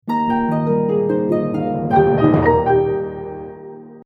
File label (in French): Jingle court Harpe; Novia Systems